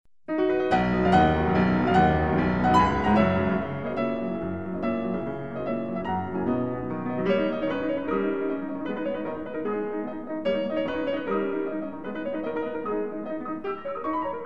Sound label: piano duo